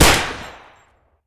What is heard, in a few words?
shoot, weapons